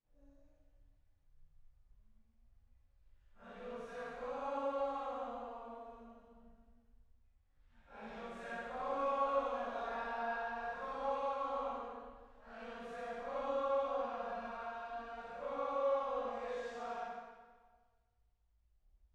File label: Choir